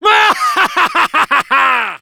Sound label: laugh evil